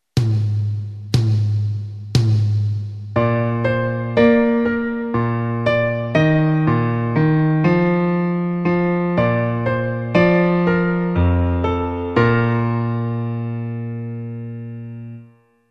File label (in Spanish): 2 voces